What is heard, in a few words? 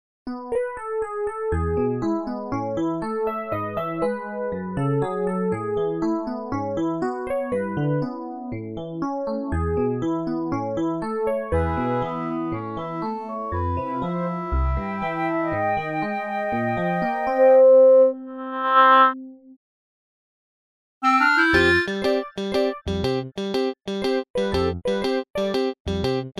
Remix in E major; Animal Crossing New Leaf; MAGIX